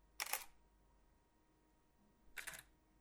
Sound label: Canon EOS 5DIII 5DMK II 5DMKIII shutternoise